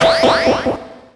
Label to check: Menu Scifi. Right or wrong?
Right